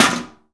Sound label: steam HIT; The Elder Scrolls III - Morrowind